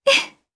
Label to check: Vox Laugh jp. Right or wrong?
Right